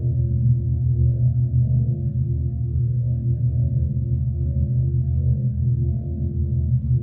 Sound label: ship idle; Stellaris